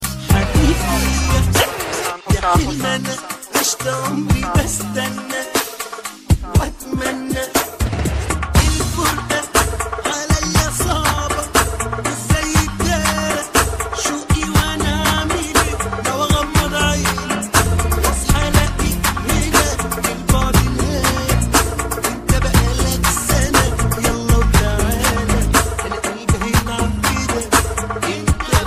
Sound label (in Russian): arabskaya muzika